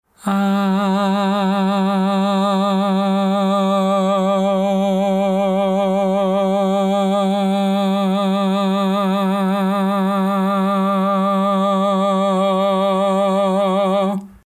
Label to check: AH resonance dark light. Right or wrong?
Right